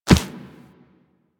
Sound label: Operator Run Dirt, Footsteps; Warframe